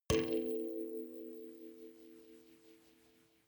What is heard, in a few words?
notification kalimba rattle body hit